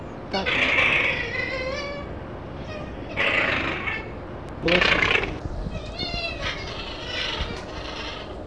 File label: Cuon alpinus aggressive